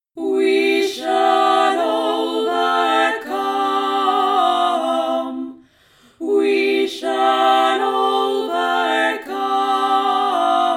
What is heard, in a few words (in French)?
VOIX 1; WE SHALL OVERCOME 3 VOIX